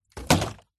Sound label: foley bottle plastic full soda water drop or knock over hard surface; zapsplat